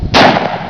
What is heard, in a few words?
shotgun fire